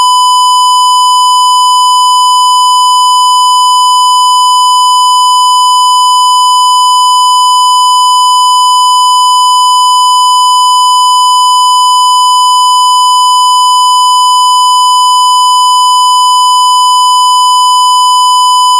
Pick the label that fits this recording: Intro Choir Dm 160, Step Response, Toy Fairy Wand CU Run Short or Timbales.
Step Response